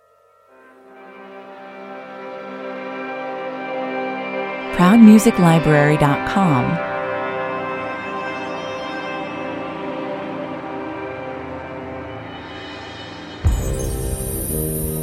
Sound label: medieval times trailer 60bpm